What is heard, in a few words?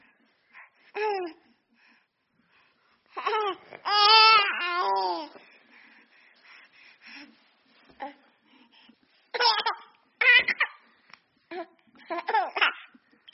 Nursery Baby Excited